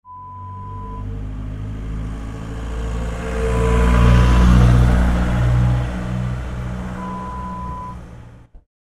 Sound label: truck drive by sound